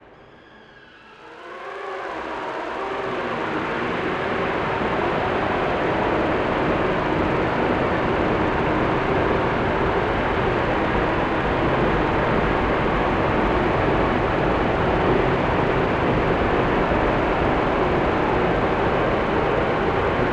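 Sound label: Design Eerie, Sinister Textures; InMotionAudio; Sinister Textures Volume 2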